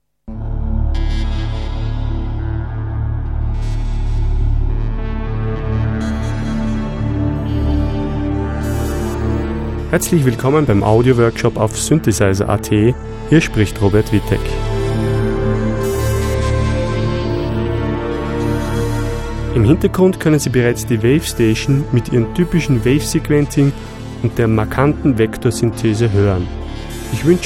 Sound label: wavestation